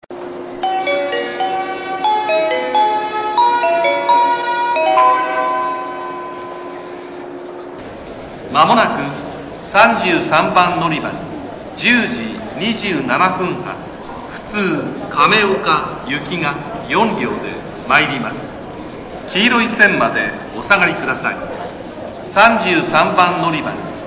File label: kyoto track33 local kameoka, eki